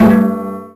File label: Cello Hit; SFX Kit; Foley - Misc